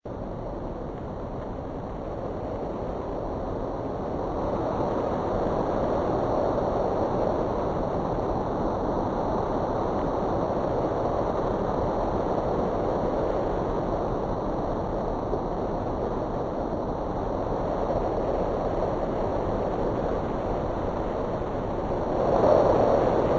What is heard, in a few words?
wind